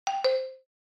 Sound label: Marimba alert message